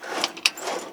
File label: Plane P40 Lever Rudder Pedal; Airplanes